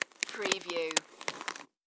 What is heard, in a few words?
Bag Plastic Hand Remove; sound effects bible